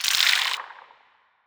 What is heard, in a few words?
damage; Barotrauma; Spineling